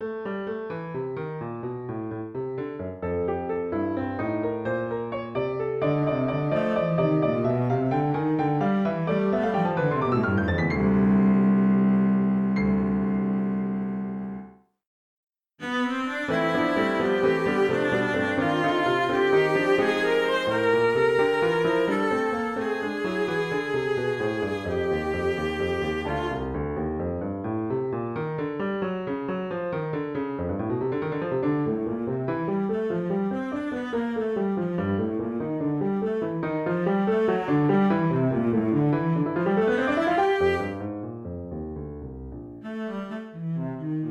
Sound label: Cello Sonata Start 2 Mov; Classical